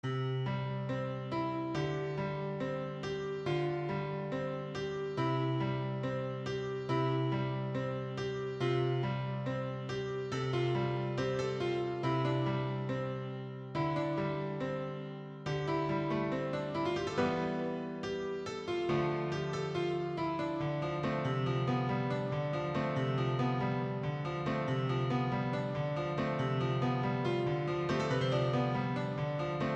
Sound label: piano; lazy